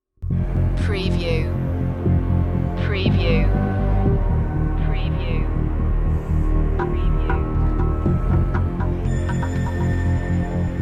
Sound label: underworld series dramatic cue